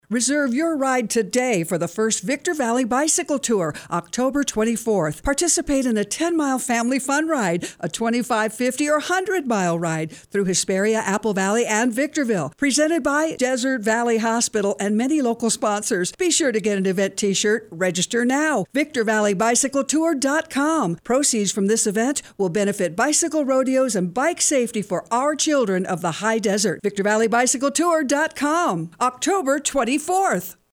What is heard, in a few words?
music dry